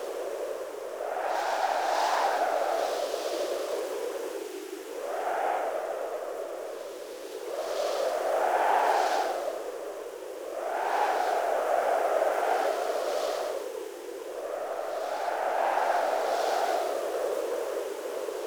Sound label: Exterior Wind Bed Loop; Warframe; Sentient Devourer; Ambience